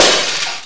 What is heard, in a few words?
Glass Ball Break; Barrack